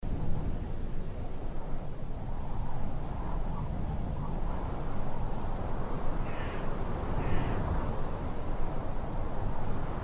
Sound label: ambient